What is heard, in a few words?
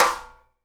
SNARE RIM, Live Snares